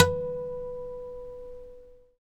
GUI A CHAR B, Acoustic, Roland

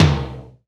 Old Skool House Perc

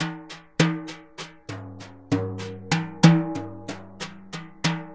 Synclavier Percussion, World & Orchestral

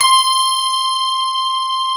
PF C 5 -L, GRAND PF; AKAI S-Series CD-ROM Sound Library VOL-7; D.GRAND PF

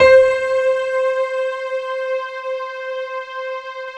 piano, Piano+String; Sound & Vision; Gigapack I CD 2

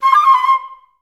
FL ORMJ C5-L, Advanced Orchestra; Best Service; Peter Siedlaczek's Advanced Orchestra

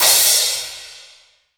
PAIL 18 INCH, CRASH

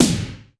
Korg 01W MegaDrums; drum machines

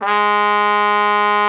G#3, TRUMPET S; Xsample VOL-3

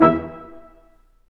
BRS Quintet stac; Roland L-CD702; Quint.Stacc

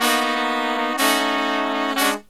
Killer Brass Riffs; USB Soundscan vol.29 - Killer Brass Riffs; AKAI; 63WETSECT

